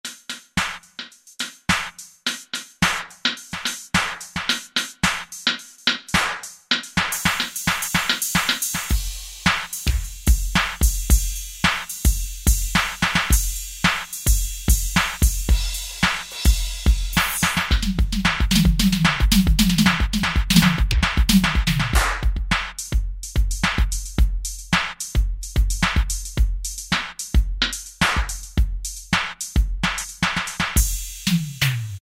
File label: Kit, DRUMS, Kurzweil K2000; Kurzweil K2000 Contemporary ROM; Contemporary ROM Programs and Demos diskette